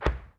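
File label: explosion light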